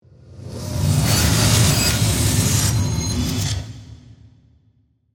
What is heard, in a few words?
Whoosh Mechanical, Metallic, Ring; BlastWave